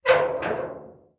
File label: Creak Metal Other